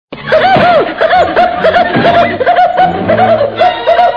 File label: duck laugh